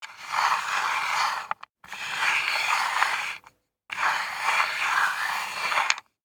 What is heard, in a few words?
DICE on Wooden Tray, Slide, Standard, 3 Three Dice; Samples; ArticulatedSounds; DICE Sfx Party (Extended)